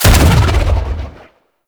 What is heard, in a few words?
Sentient Rifle Shot; Warframe; New War